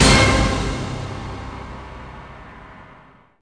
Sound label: Menu Sting; The Weakest Link; PS2